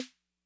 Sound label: Snare Mid Tone Mid Snap, 808; classic machines